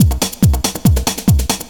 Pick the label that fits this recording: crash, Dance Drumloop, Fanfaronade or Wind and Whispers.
Dance Drumloop